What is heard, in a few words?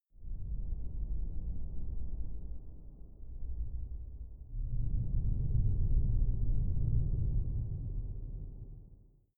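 space unknown danger low sub, science fiction; atmosphaeren umwelt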